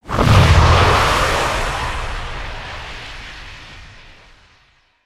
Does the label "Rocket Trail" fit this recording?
Yes